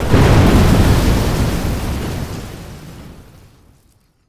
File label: Explosion Blast Debris Large; Fire and Explosions